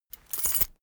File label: key ring mid size pocket put